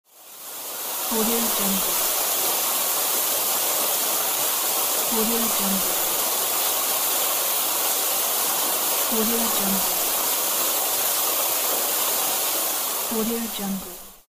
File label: fountain